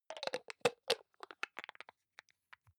hygiene small screw cup close soft, badezimmer

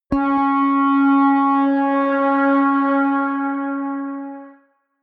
synth bounce high, drones

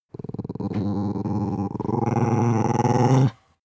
dog small growl regular; natur-tiere; hunde

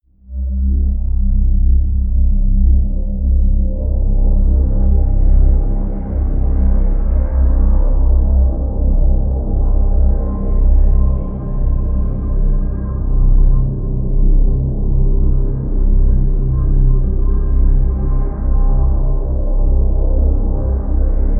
drone deep didge

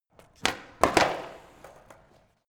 skateboard indoors kick

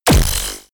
sci-fi plasma cannon fire

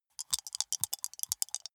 small two rattle in hand closed, wuerfel

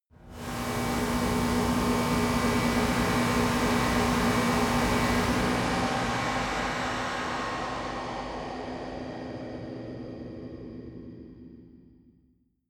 atmo stinger, horror

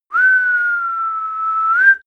whistle call; koerpergeraeusche; menschen; soundpool

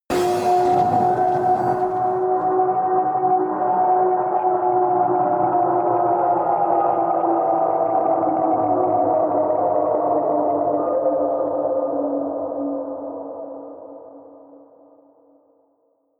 stab electronic rise, drones; musikelemente

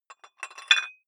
dumbbell thread put on small weight soft; sport freizeit; fitnessstudio

